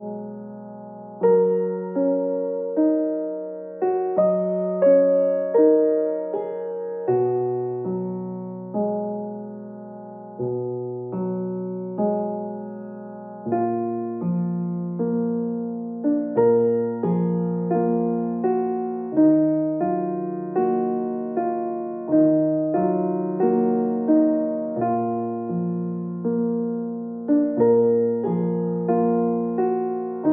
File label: Old Country Music